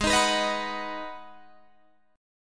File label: G sharp Minor 7th G sharp, Guitar Open D; 18,18,21,21,21,21